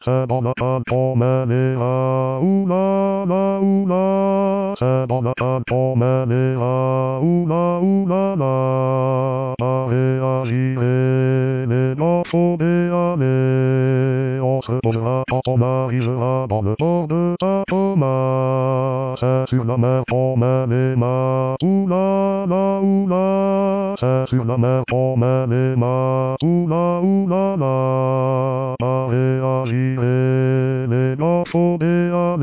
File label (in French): Basse